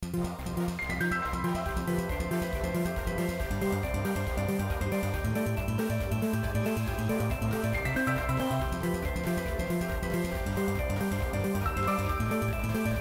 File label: nokia 1110 ringtones nocturnal